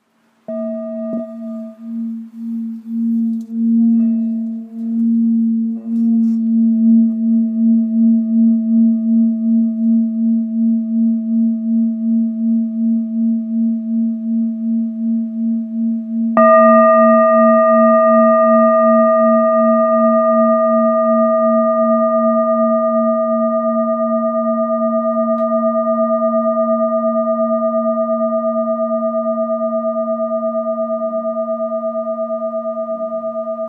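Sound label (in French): Bol bengale la dièse; 900g-1kg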